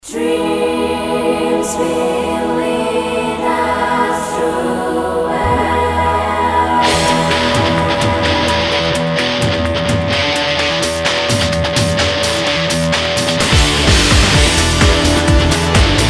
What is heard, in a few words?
ringtone; mushiuta